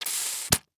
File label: Industry Tool Plunger Wet Plunger Suction On Cement